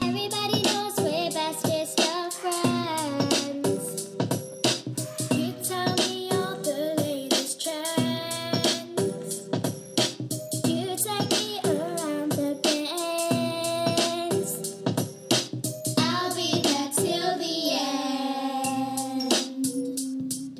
primary school music workshop

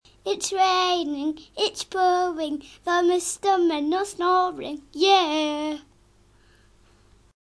snoring; mr men

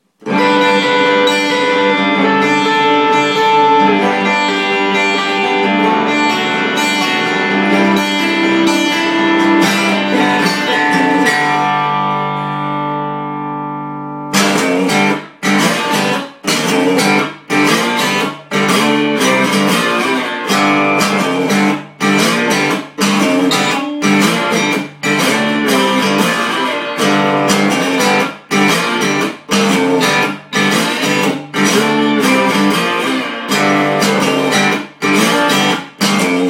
P90 half vol slide blues

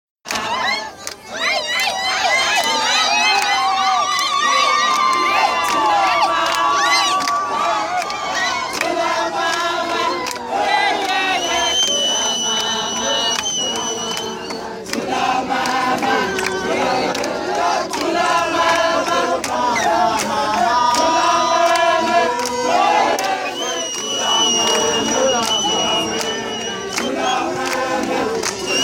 more singing outside Mandela's home in Houghton; recorded by howden africa

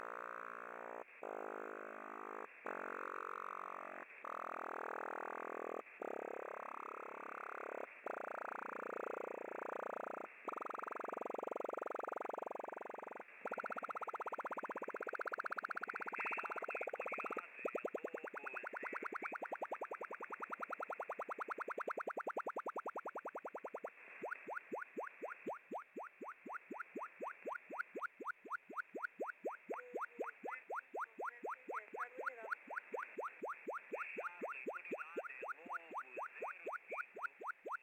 unknown four waveform transmission USB; 8992 USB UTC

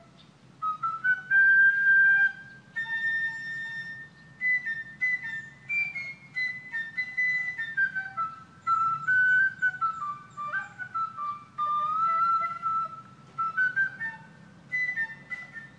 flute

